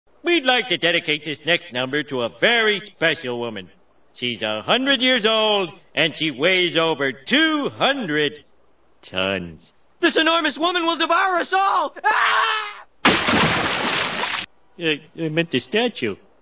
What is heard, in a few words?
quartet